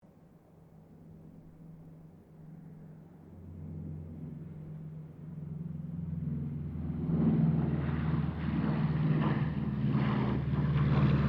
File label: AIRCRAFT LOCKHEED HUDSON PASS DISTANT, twin engine light bomber; 1941; Airplanes; Vehicles